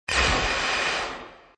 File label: Synthy stopping sound.; Synth Stop 1; Stops; Sound Design